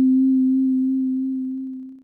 synth, amiga med

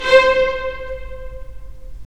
STR, Roland

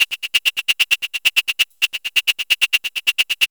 KIT, DRUMS & PERC

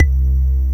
VELIMBA; MORPHEUS

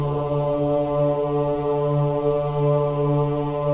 choir ohs, AH D3; Spectrasonics; Symphony of Voices

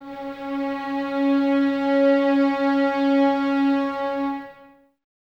violins C#4, string ensembles; Miroslav Vitous

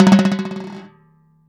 ABANICO; CUBA

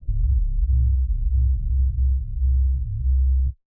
weird sub wave, bass

